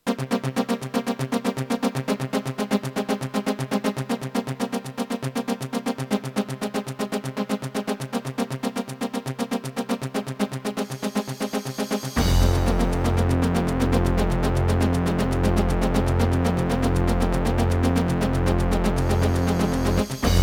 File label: Gravis Ultrasound MAX 16 hardware; soundcardrecordings